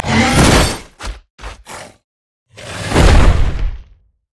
emote death; sejuani

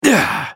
spell cast effort; Lucian

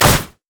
reckless swing; olaf

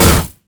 mage chains; LoL; ironforge xerath

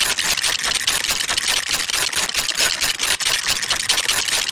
chomp; LoL; jinx base

